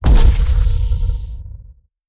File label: stellarite attack plasma; Stellaris; guardians